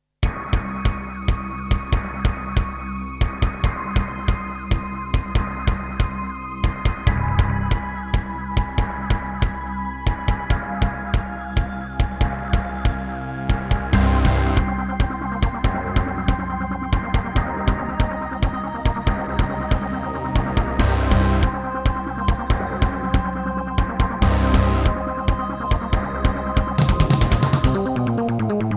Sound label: Yamaha MU2000EX; GSMODE; sigil doom episode 5